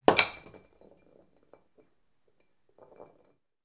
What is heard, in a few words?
Glass Jar Drop